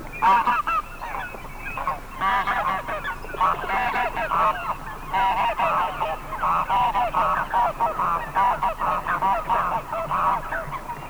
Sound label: anser anser